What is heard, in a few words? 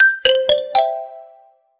opening a case in the, reward